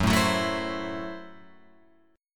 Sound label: Gb Minor 7th Flat 5th, Guitar Open F; Gbm7b5; strummed; 6,4,6,4,7,7